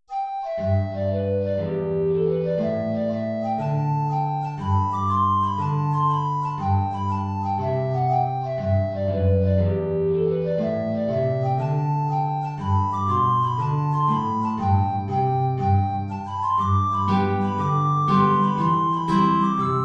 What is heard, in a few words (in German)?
Hornpipe Altflöte Gitarre tiefe Notierung; The Belfast Hornpipe